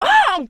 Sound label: pain